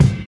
prominent kick drum c sharp key

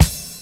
trebly snare drum c key

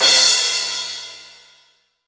crash cymbal sound f sharp key

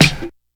snare e key; snare drum samples; drum single hits one shots

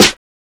reverb steel snare drum sample a sharp key; Duration 0:01; Mono

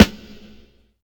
large room acoustic snare g sharp key

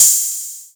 low treble open hat one shot a sharp key

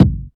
solid kickdrum sound c sharp key; kick drum samples

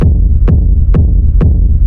techno sub kick black; drum loops

